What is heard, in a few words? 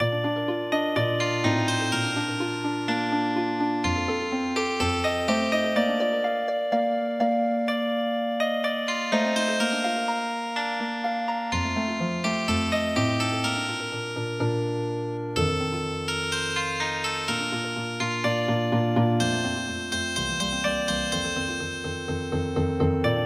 Healing Harp, midi